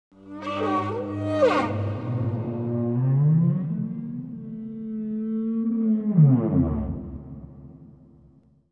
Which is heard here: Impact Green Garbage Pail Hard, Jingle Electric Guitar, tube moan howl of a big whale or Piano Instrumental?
tube moan howl of a big whale